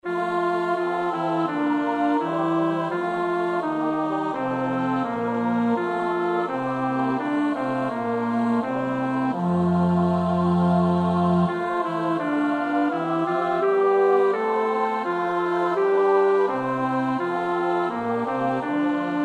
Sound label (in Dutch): bas, koorpartij oefening; Somervell (Arthur); The Passion of Christ